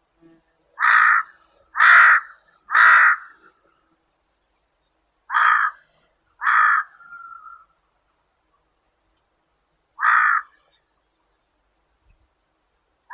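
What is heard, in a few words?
large-billed crow, Corvus macrorhynchos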